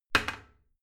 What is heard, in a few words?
toilet seat slam heavy; haus-garten; badezimmer